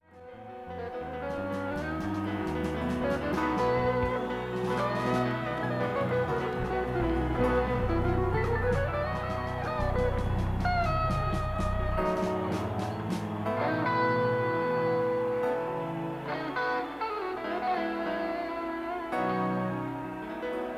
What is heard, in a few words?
Tuning